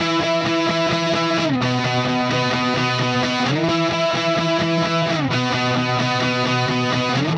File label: Guitar, Indie Rock; Sonic Foundry (Sony Creative Software); Indie Rock Anthems; Session 10 - Roswell